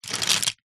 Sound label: office pens pencils handful pick up from table; zapsplat